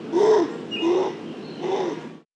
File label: kangaroo effect